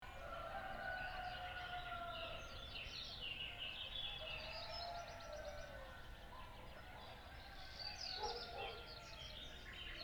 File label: Ambient Dawn